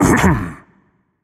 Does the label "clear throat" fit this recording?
Yes